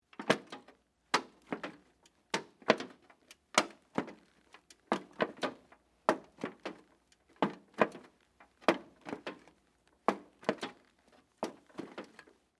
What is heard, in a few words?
Scaffolding Walk, Footsteps, Wood, Metal, Surface; BlastWave